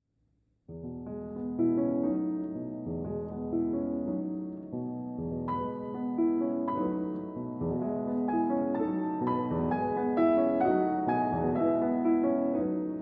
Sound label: Berceuse Transcr. for Piano; Dolly Suite, Op. 56 I.; by Alfred Cortot